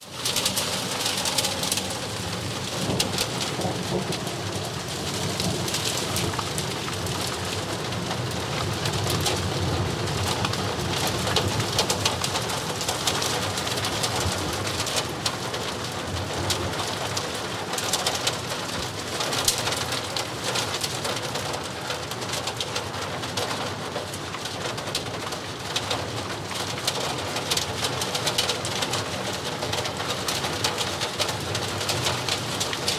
zvuk grada

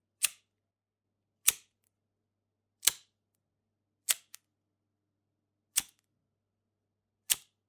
zvuk obychnoj zazhigalki

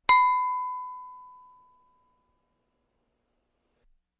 malenkogo hrustalnogo bokala

